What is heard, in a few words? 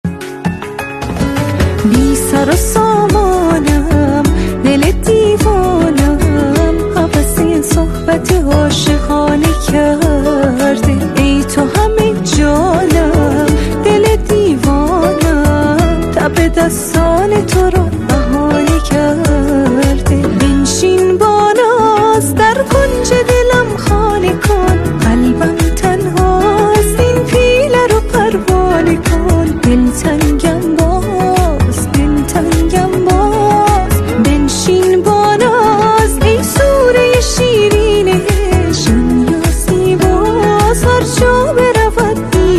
Female Voice